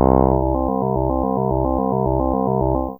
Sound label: Bass Rick C3, Fairlight CMI IIe